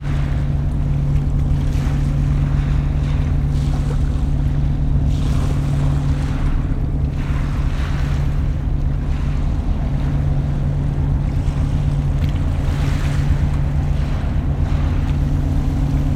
transport boat ship ferry idle dock next to stoney slipway waves lap